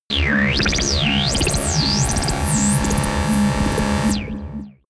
alien synth

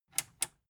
metal button tiny click enable slow

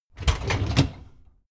dishwasher tray open

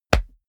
combat chest hit

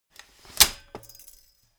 folding chair unfold fast

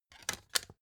cd in cover

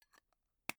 tobacco tin close

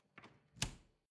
refrigerator door close moan

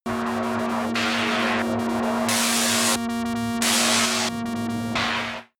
scatter vent synth

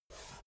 chess piece move

